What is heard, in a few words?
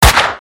Beretta